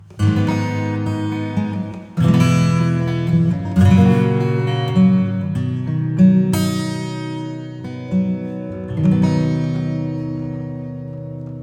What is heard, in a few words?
acoustic Clean Acoustic Guitar